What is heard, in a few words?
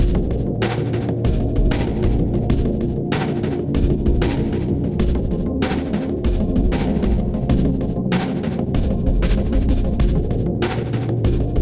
loop fast